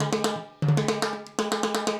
Acoustic Percussion

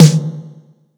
acoustic drums

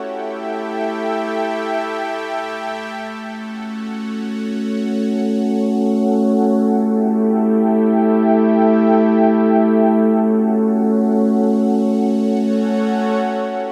Pad, Synthesizers

